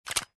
Pen Charge

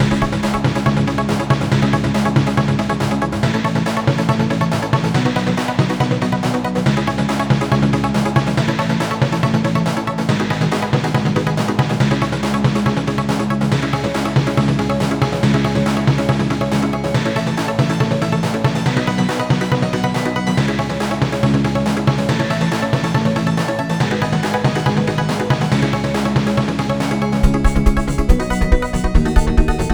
Medium Size Battle

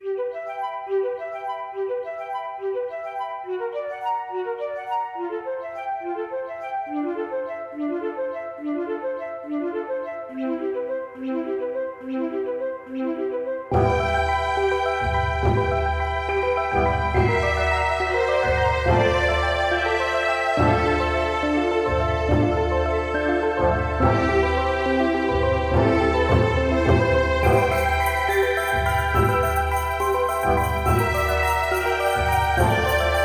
spooky; dark deity

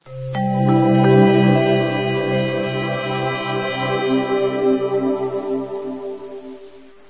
Win Game; super slyder

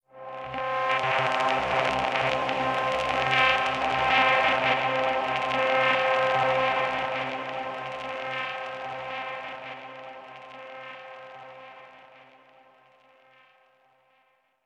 Stinger Exploration Drone

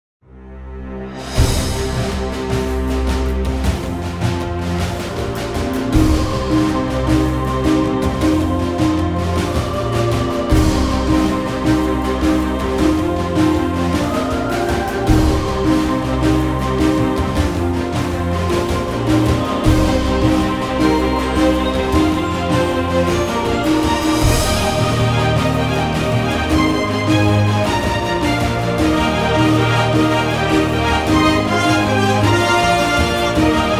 Gladiator Theme - Orchestral Mix; Swords and Sandals Immortals Soundtrack 2022

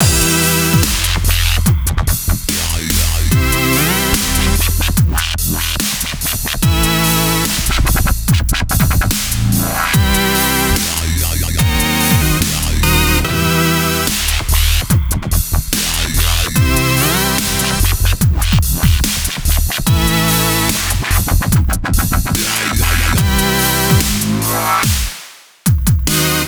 drop; Intake

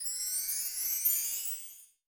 health sparkle

